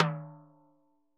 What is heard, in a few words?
Timbaleta Drumhead; Best Service; Festa Latina VOL-1; Single-Hits